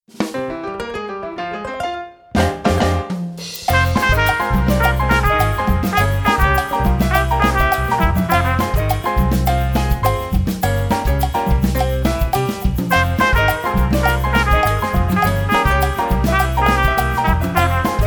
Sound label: Salsa Shoes